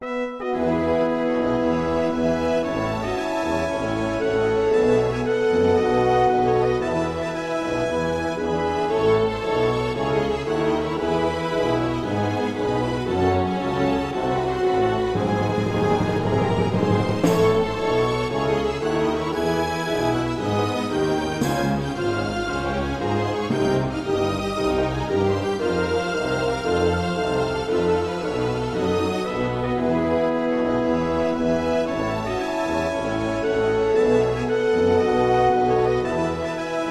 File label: syukuhai march Loop